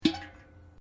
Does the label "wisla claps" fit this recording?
No